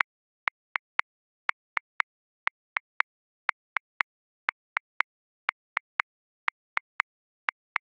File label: Bomba Shaker 8ths 199 bpm; Grooves; Ableton Live 12 Lite; Core Library